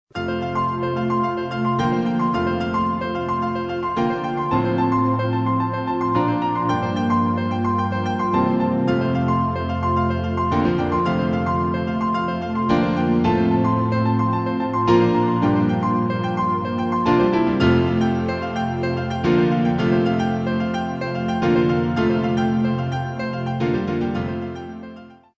newage

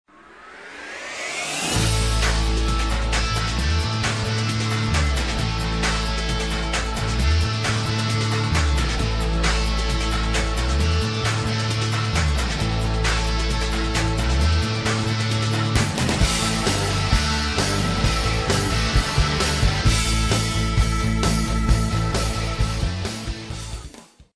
rock heavy

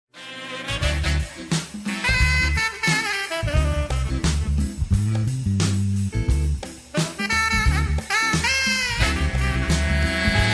jazz funk

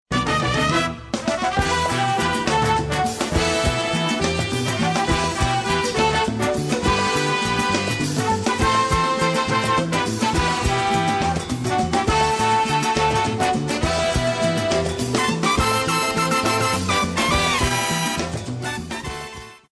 tropical

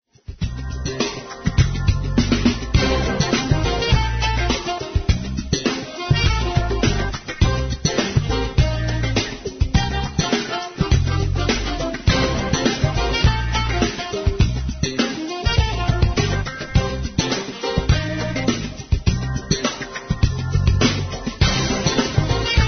jazz pop

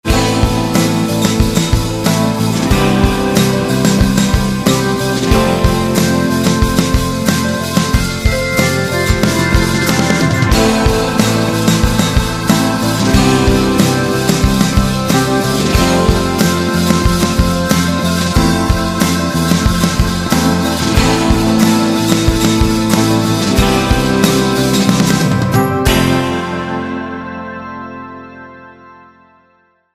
Pop Rock

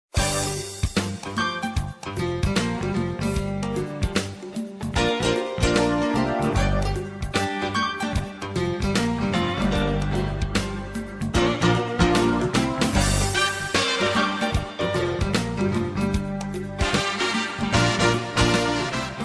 jazz funk

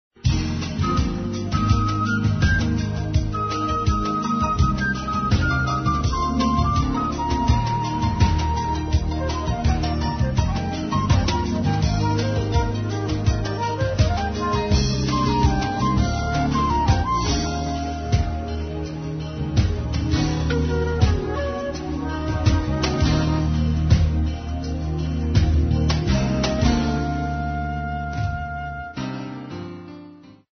jazz smooth

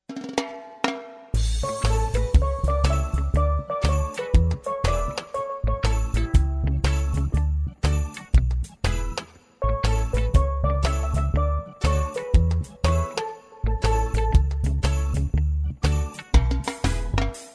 raggae One Drop

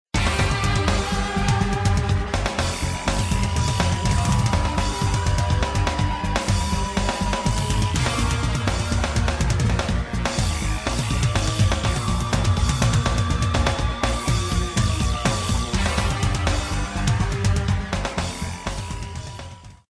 rock heavy

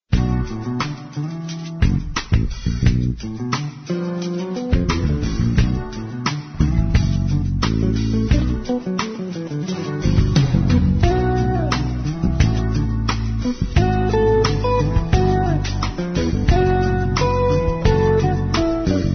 ez lite

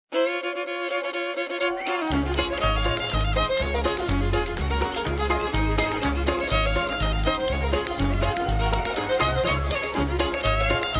country

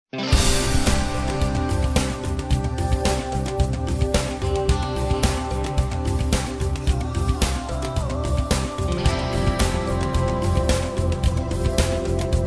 pop rock

